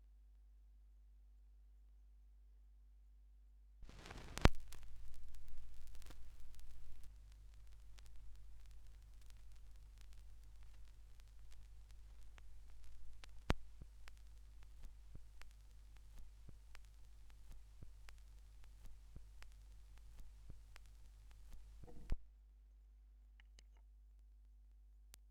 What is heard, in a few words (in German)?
schallplattengeraeusche; rauschpartikel